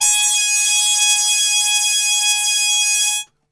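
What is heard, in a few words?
G#3, Brass, trumpet harmon mute; Best Service; Brass Super Section; TRP HARMUTE; 90 s Sample CDs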